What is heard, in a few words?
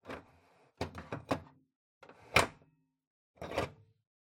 Ceiling Light Tube Remove